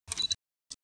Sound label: desk viewer, computer